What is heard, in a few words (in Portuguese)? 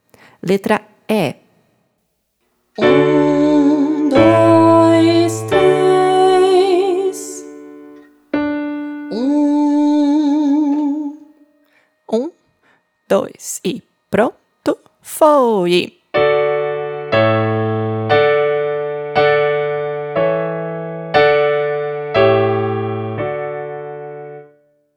Melodia Solfejo Melodico